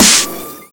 SNARE FX, Big Beat; Big Beat Samples & More; AKAI

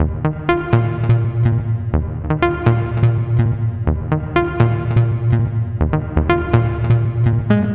Hopalong, Modular Electronica Synths; Sonic Foundry (Sony Creative Software)

